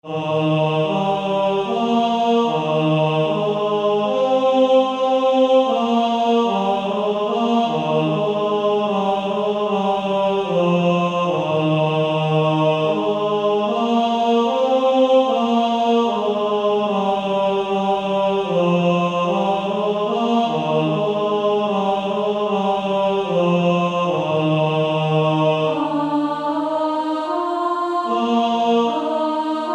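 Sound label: Male Choir tenor 2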